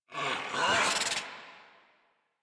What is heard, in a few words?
Infested Charger Run Breath; Warframe